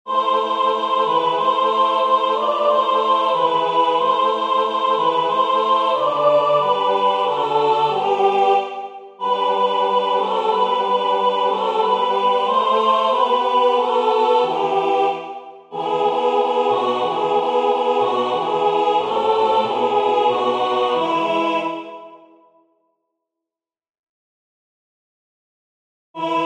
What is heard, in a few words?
Slavikovsky Polecko Maly Sopr; A.Dvorak